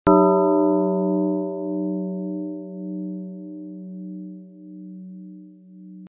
Ring Tones supplied with the 8180 SIP Audio Alerter; Algo; Bellen